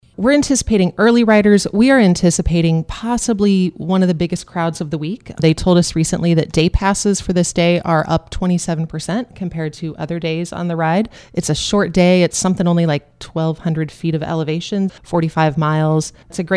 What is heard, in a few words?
Crowd